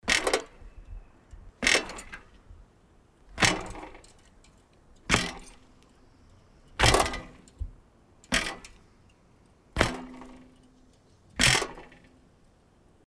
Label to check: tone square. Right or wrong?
Wrong